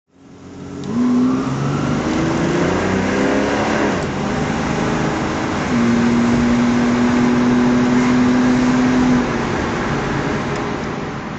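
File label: E-type v12 automatic drive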